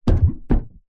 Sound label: vehicles car bonnet hood hit footstep bang ext